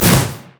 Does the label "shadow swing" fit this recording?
Yes